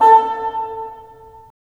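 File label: WND BN, wind bassoon; LA Composer vol. 3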